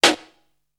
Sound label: wacker, snares; Zero-G - Funky Elements